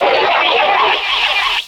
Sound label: SFX, 180 BPM, Drum & Bass; Ueberschall; Drum & Bass Flash Trackz